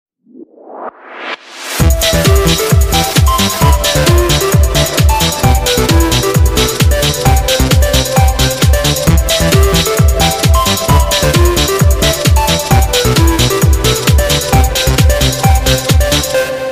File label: Nokia House Beat